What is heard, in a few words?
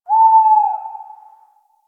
Forest Owl; Warframe; Grineer Forest